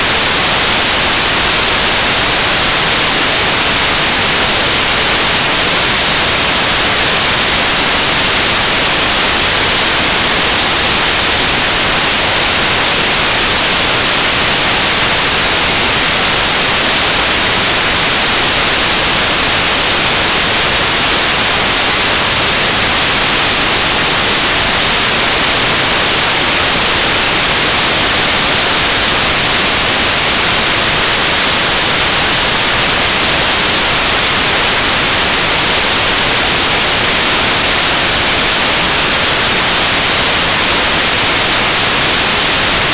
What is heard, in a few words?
white noise